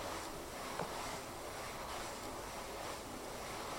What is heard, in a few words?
Fluffy